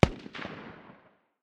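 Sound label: light machine gun far distance; tacz-tweak-pack; taczmechanics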